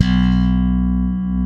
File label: SLAP B, bass; Spectrasonics - Bass Legends CD 1; ALL THUMBS